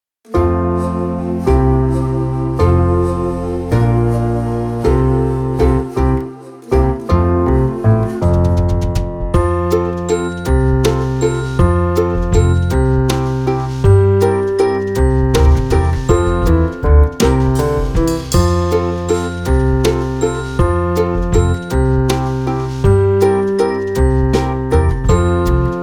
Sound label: fl cl cor